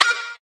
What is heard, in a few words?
wup